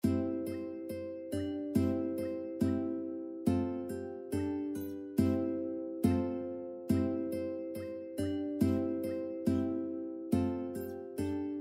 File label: ukulele